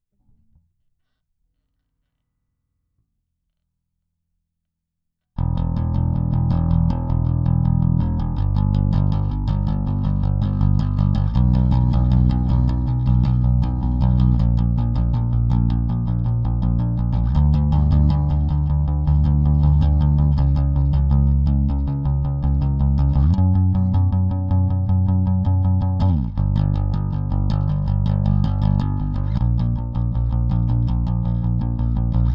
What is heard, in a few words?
Pick Pre Comp